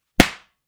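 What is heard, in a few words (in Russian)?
удар ремнем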